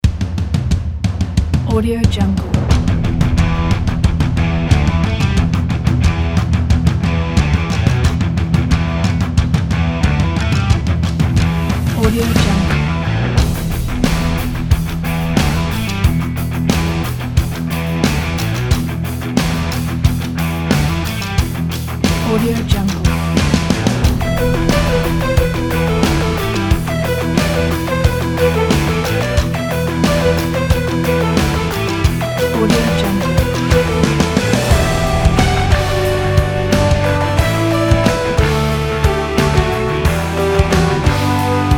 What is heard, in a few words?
energetic rock; noisesoul